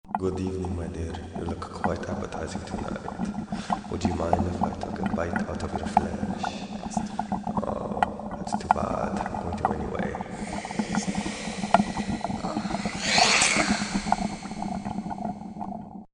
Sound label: Vampire, Halloween; Halloween Sound Effects